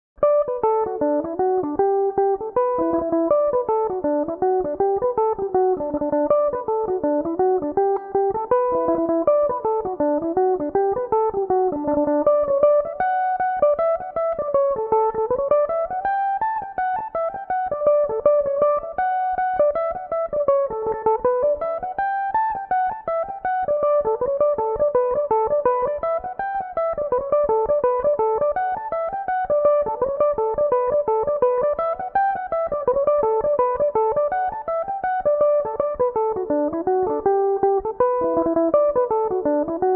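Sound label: limerick lasses D major, learn some tunes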